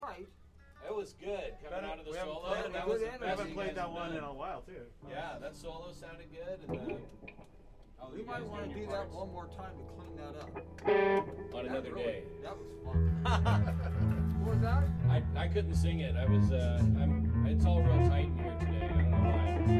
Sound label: Room Mic